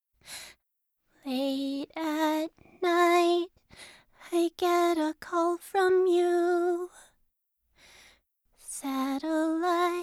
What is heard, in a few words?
Song Acappella Us; AI The Somnium Files Nirvana Initiative